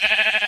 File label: sheep; minecraft